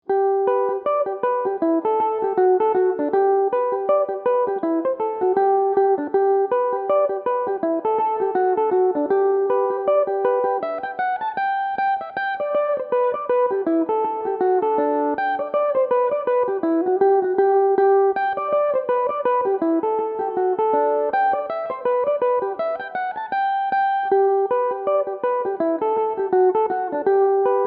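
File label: G major, mandolin; The Belfast Lasses; Belmuse EM-190 electric mandolin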